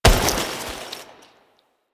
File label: Foley Weapon Fire; Warframe; Kahl